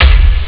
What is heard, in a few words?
kick